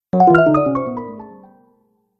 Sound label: Classic Fall Sound, video game sound effects